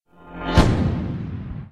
sonic boom; Clicker Heroes

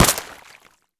monster pop; Clicker Heroes

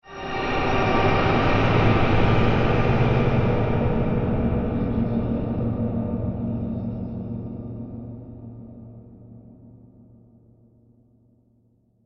tab click; Clicker Heroes; outsiders

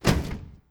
Vehicle Crash; Project Zomboid

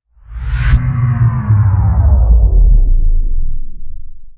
Land, TraderShips; RimWorld Biotech

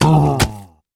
mud golem die; Clicker Heroes

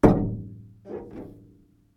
thump squeak; Project Zomboid

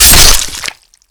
stab in; killmove; Garrys Mod

